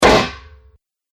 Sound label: Mace Hits Armor, Impact Sound Effects; combat